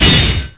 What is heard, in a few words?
CLANG, 碰撞声音素材